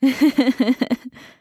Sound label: female chuckle